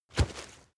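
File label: step leaves wet heavy, footsteps; schritte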